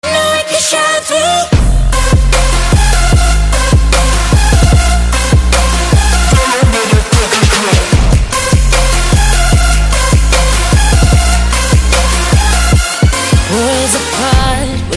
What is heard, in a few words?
music